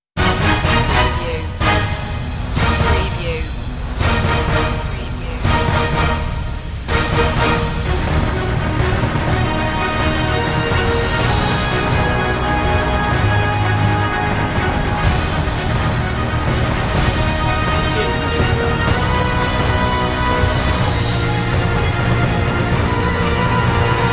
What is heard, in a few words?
no choir